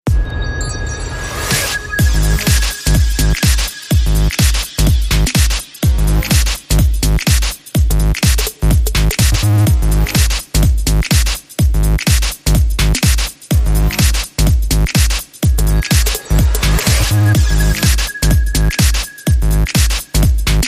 Organ Ice

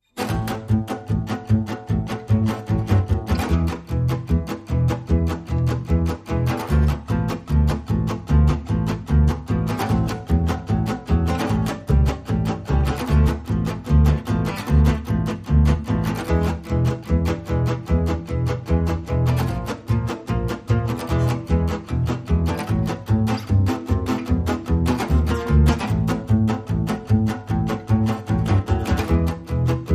Bass and Acoustic Rhythm Guitar Only

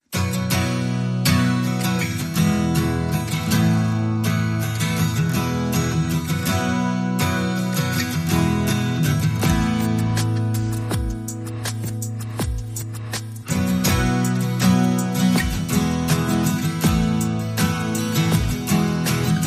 Perc and Acoustic Guitar

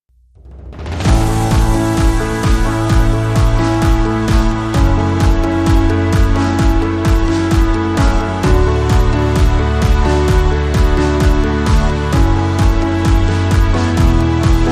Strings Versus Keys Short Rhythm G

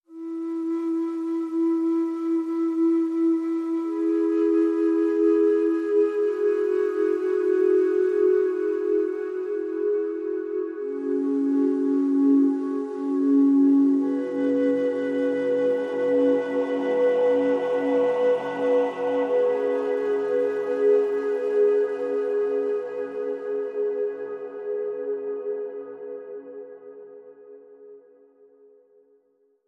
The Good Earth Flute & Choir; 30sec

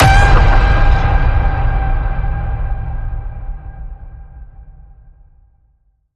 Ping It Loud, G

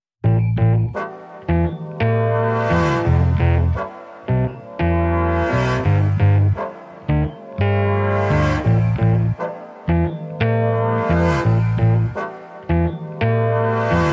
No String Stabs Mix

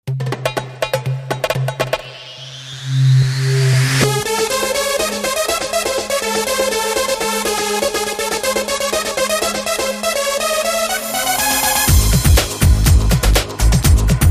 Trance Short Rhythm

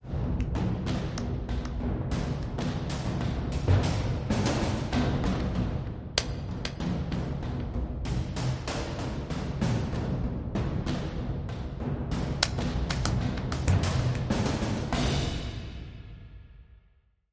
Historical War Drums

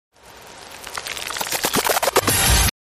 Stab Muncher

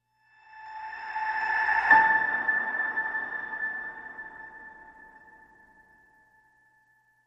Piano Ping Rev A

